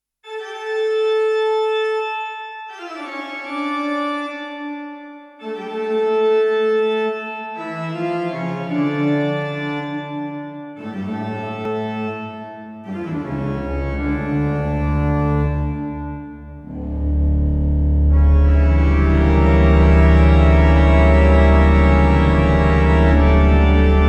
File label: Sony DRE 2000 Organ Sony DPS R7 Westminster Abbey for pipe organ